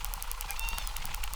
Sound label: Chirp, acquarium